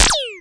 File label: laser powered spitwad, electro FX